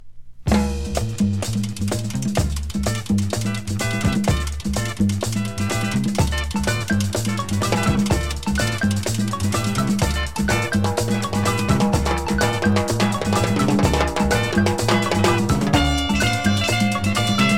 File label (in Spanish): rumba